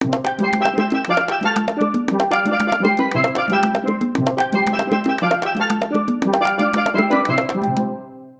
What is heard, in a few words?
Steel Drums Africa; Asus MeMo Pad 7 K01A